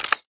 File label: idle bolt insert; smite; osiris